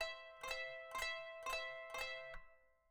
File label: kanun